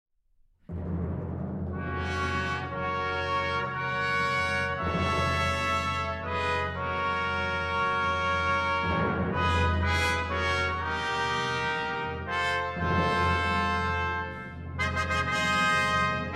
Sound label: Brass Fanfares Liturgiques; Brass of the Royal Concertgebouw Orchest